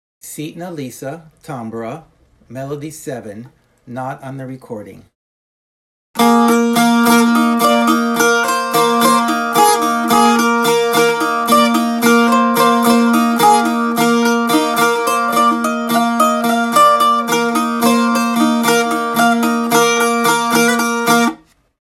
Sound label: tambura